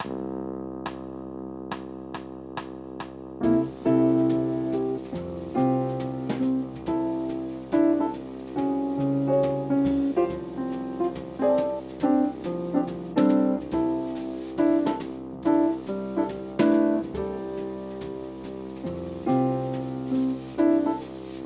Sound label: C Major Blues Piano and Drone Alto; Applied Jazz Ear Training; Practice Perfect; 12 Bar Blues Ear Training in Real Time